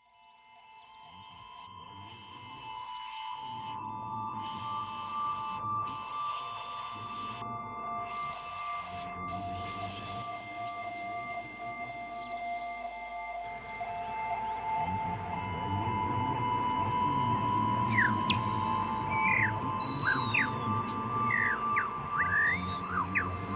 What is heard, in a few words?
bells